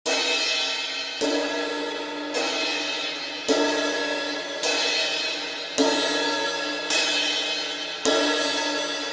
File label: Cymbal; Cymbal Cat; Mellow Island